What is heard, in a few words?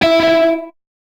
Guitar Note; Curse Crackers For Whom the Belle Toils